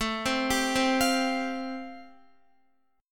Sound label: A-Minor 7th Sharp 5th, Guitar, chord; Am7#5 arpeggiated; JGuitar